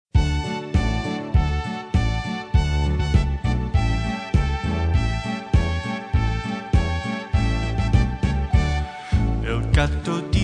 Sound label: los animales, Spanish Song; singular